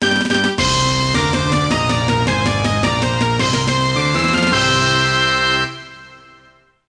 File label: Flying Squirrel Win; bully scholarship edition